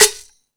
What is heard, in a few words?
Percussion FX, E-MU Pro-Cussion